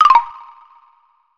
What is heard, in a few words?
Put Down Piece; Bottles' Challenge; Banjo-Kazooie HD; N64 gamerip 1998